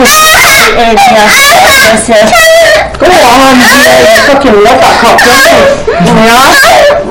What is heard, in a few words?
ahahhaahhah loud